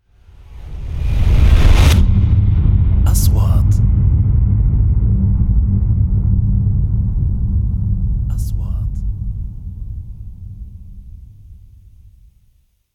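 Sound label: 3D SFX Trailer; مؤثرات تشويقية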